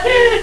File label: Flip